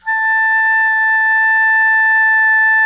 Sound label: SOPRANO A 4, Saxophones; Greytsounds; Philip Wolfe David Crozier's Saxophones